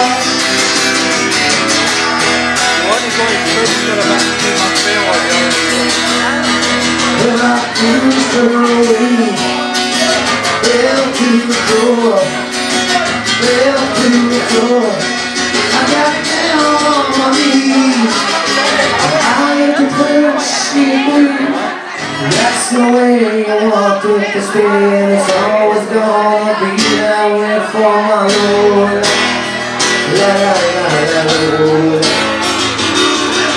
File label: acoustic